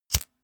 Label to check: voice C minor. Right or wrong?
Wrong